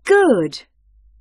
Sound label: Correct